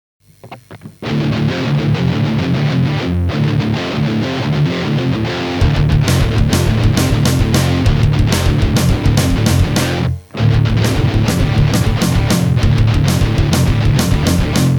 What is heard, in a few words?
Offbeat